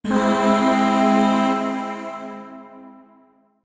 Void Shawzin Phrygian Chord

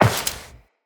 Step Metal Perforated, Footsteps Walk; Characters; Tenno

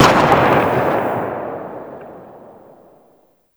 Lato Tail, Weapons; Warframe

